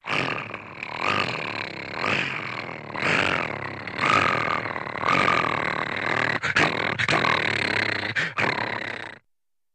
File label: Funny Angry Dog Grow